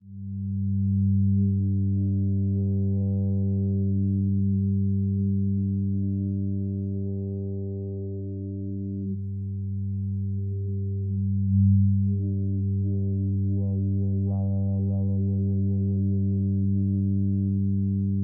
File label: oscilloscope